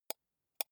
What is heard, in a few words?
computer mouse wheel click slow soft